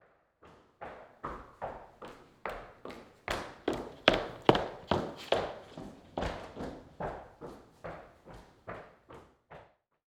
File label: Foley Footstep Hard Sole Run By Between Surfaces; Foley Footsteps